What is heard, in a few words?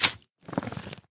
jump, magmacube; minecraft; mob